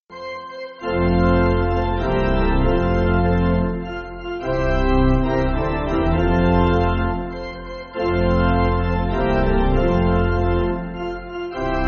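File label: Pipe Organ, Backing Files for Churches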